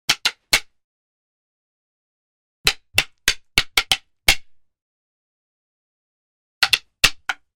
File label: Stick Hit Combo; Hollywood Edge; Martial Arts and Human Impacts